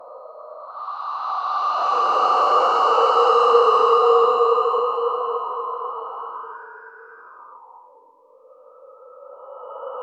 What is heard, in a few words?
creepy rustling and breat, alien